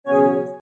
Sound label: trophy; ps4